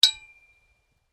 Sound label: Metal impact: light hit on hollow metal pole; Miscellaneous Impact; Stuart Duffield